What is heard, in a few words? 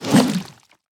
swing; zombi2